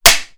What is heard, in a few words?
Clapboard Sound